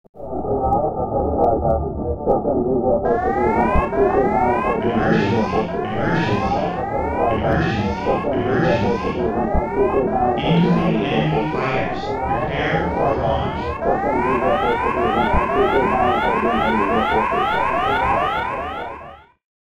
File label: Emergency Call; Star Fox